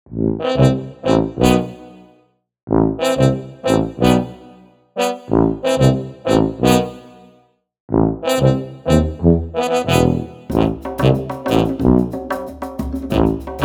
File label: Underscore, Balkan Brass; Narrative Mind